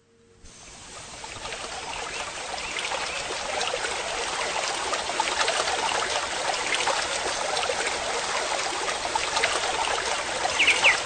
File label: By a Stream